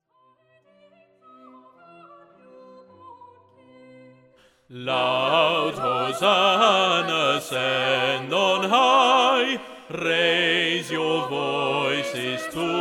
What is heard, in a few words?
Bass Up, While shepherds watched their fleecy care